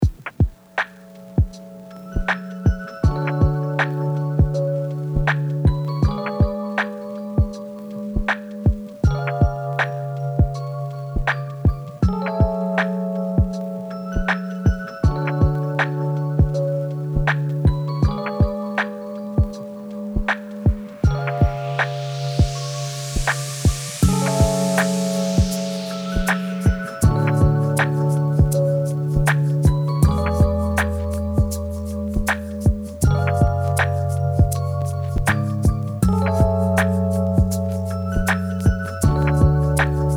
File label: lofi